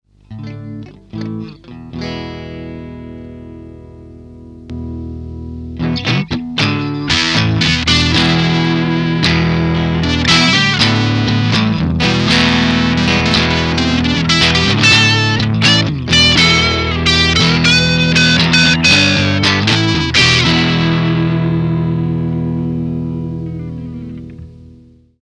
F-Driver; circuit demos

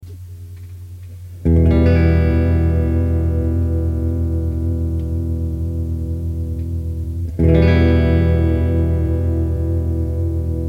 clean vibe slower; circuit demos

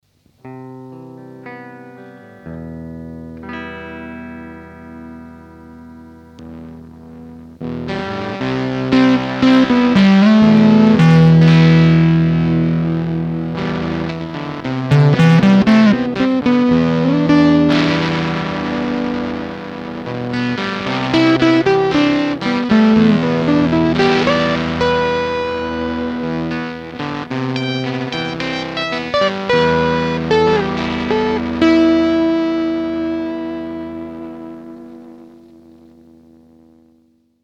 Ghost-Finger; circuit demos

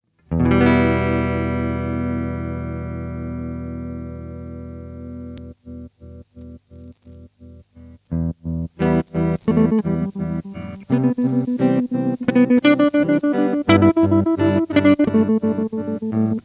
Trem, circuit demos

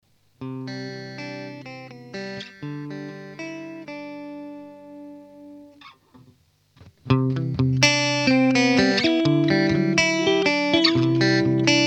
DOD 525A Comp Limit; circuit demos; factory

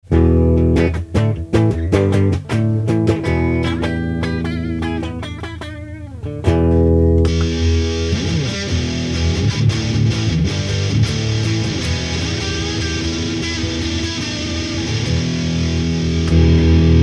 Boss DS-1 Stock VS Modded; circuit demos; factory

